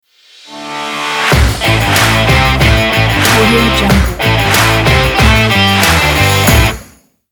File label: rock logo